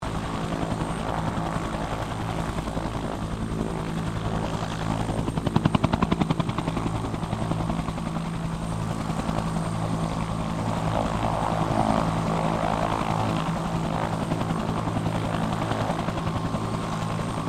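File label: helicopter Bell UH1 Iroquois idle; RAN UH-1 Iroguios; Helicopters (Sound Effects:Vehicles)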